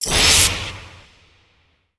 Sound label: Heal